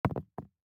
DICE on Neoprene, Throw and Roll, Metal, 1 One Die; Samples; ArticulatedSounds; DICE Sfx Party (Extended)